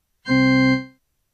flute

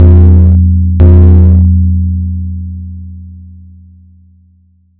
Bass Drum

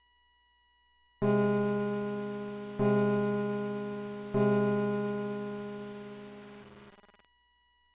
string

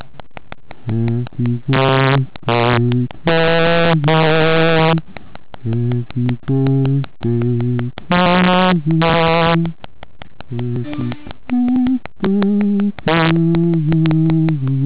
lower key, effects

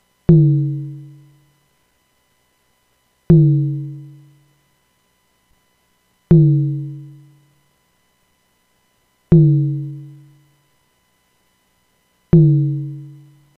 nonlinear drum

